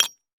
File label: brick; minecraft